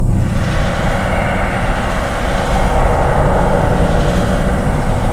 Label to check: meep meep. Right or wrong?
Wrong